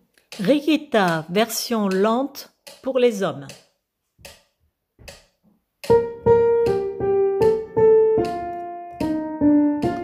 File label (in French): couplet basse